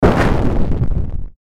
Hit Impact Metallic, Hit Impact Metallic Distortion; Blastwave